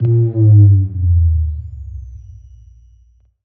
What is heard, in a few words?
Steady Drop